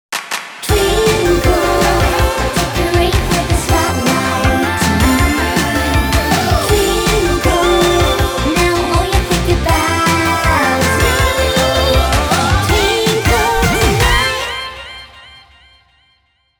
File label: Twinkle; Festibattle